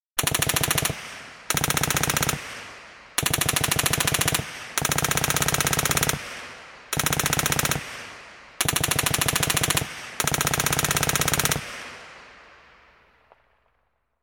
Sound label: General purpose machine gun firing from behind & above; Machine Gun Sound Effects; BBC